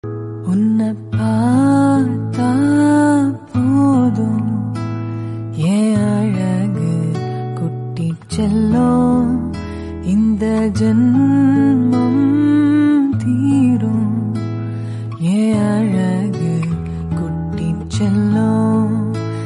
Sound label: cute baby message tone